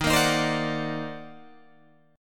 D sharp Minor Major 13th, Guitar, DADGAD; strummed

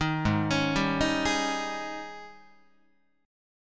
G sharp Major 7th Suspended 4th, Guitar; G#M7sus4 Chord

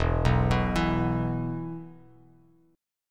E 5th, Bass Standard; arpeggiated; JGuitar

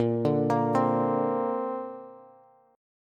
Bb Major Add 9th, Bass; arpeggiated

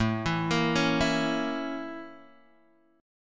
A sharp Minor Major 7th Flat 5th A

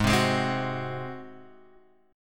Ab 7th Ab down; Ab7 strummed; JGuitar

